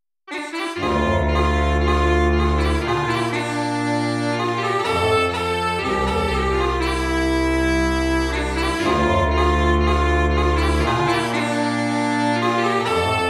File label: irish pipes